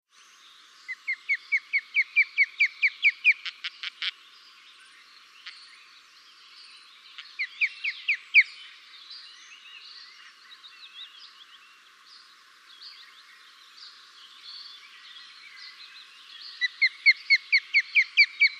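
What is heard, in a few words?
skopa voice, osprey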